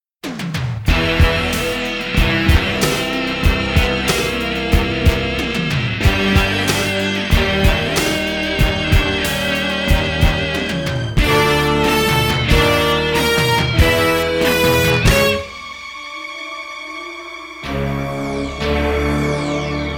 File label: Building Tension, soundtrack; Saber Rider and the Star Sheriffs